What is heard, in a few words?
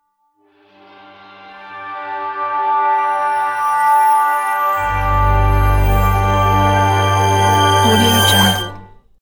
clean bright logo